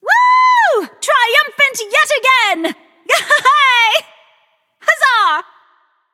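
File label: nonverbal yay; smite; voicepack; izanami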